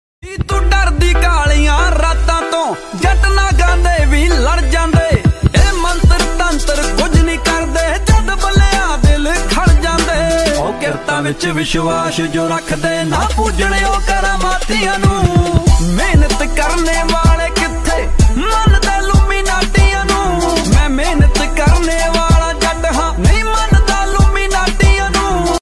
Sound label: Desi Punjabi Ringtone